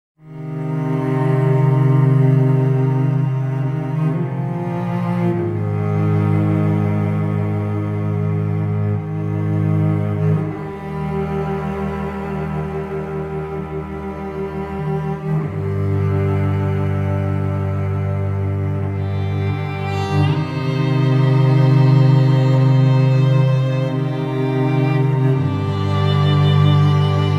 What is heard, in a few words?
Cello clouds of a violin; Relaxing Music